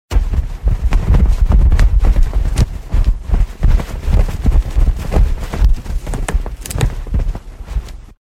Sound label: kimono samurai walking around